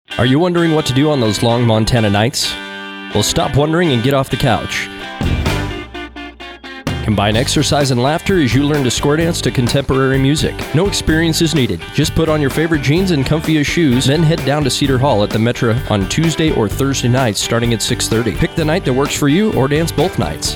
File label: Squaredance